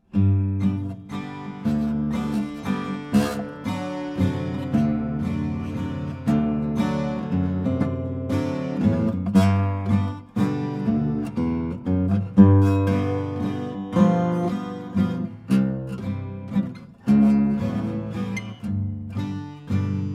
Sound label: 12 str, guitars